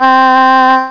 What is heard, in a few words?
Vocal; Hyper Stacks; Pacific HiTech; MandelMusic